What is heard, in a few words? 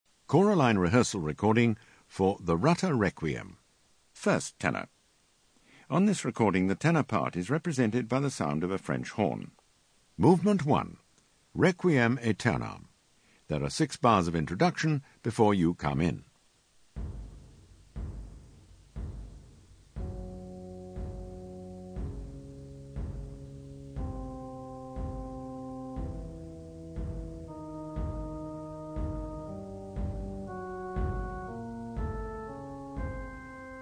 Tenor